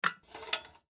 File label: Lantern Hide; ThunderWire Studio; UHFPS; PlayerItems